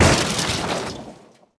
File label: WRECK, Vehicle